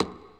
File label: Slap